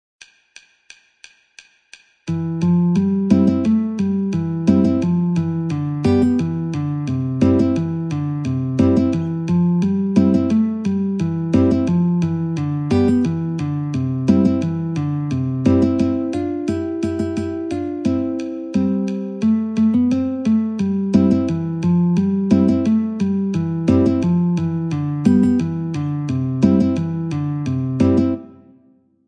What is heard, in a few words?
Wild Flowers Guitar, metronome 88